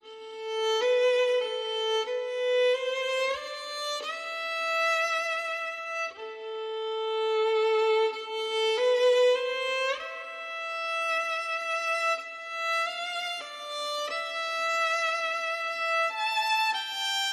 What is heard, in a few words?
Sweeping Waltz, Chamber